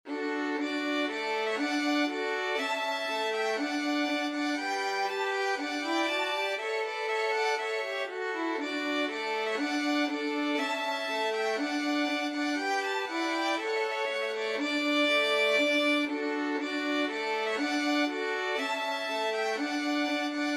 violin trio; charpentier te deum

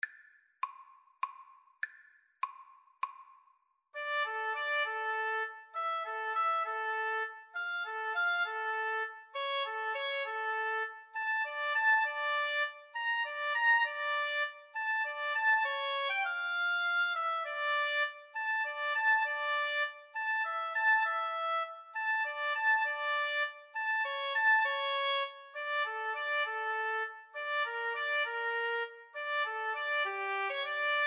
Oboe Cello Duet

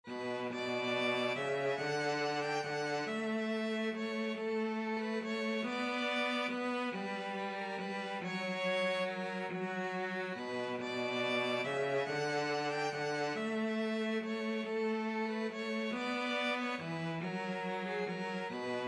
violin cello duet

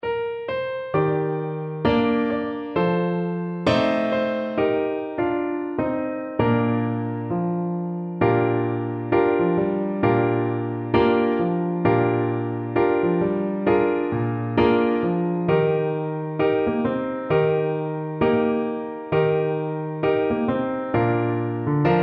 this little light, Tuba

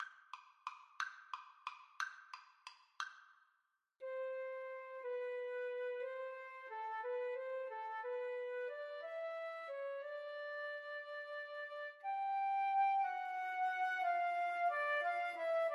waltz, Flute Duet

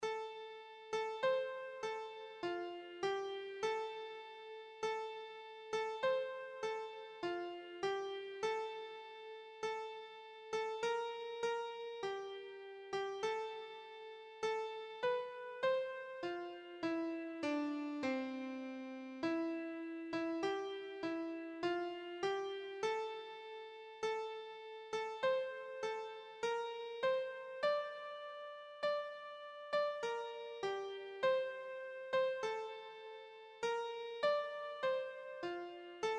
st george, keyboard

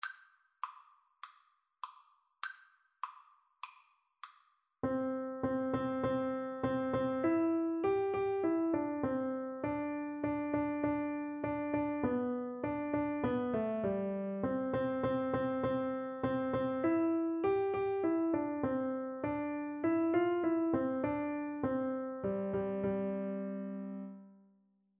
Piano Duet

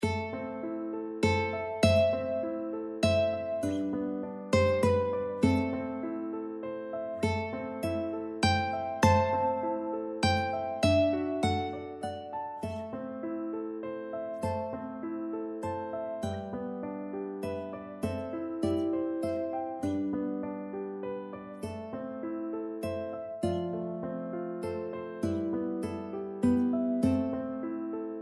scarborough fair, mandolin

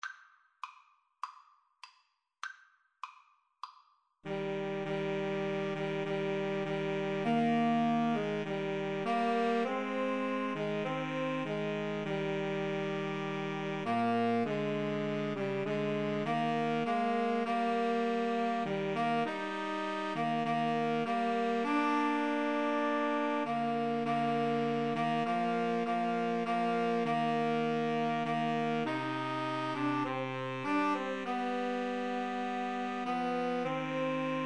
SAX, Woodwind Trio

